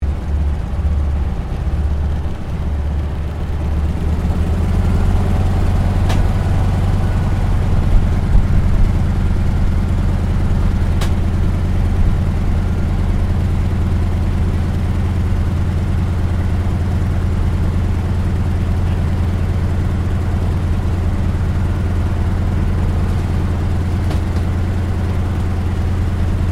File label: AIRCRAFT CAC WIRRAWAY TAXI INTERIOR; CA-16 Wirraway; Airplanes; Vehicles